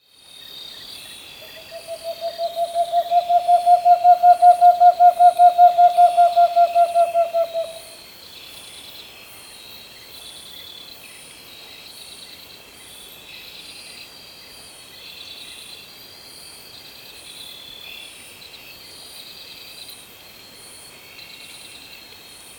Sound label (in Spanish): Autillo de Marshall Megascops marshalli